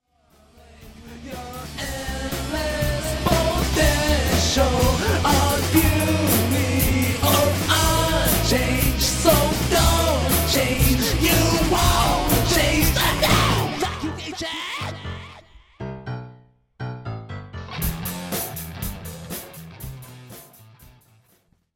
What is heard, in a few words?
song